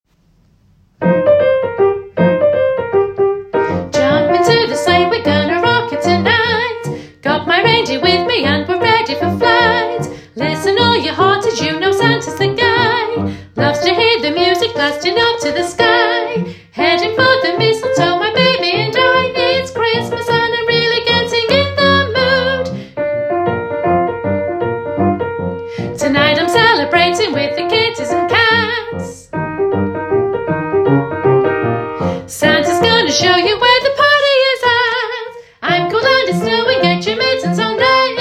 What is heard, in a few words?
bass